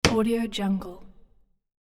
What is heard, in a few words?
hammer nail into wood single hit; hollywoodedge